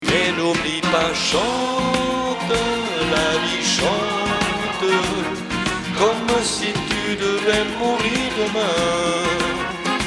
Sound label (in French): Chante oui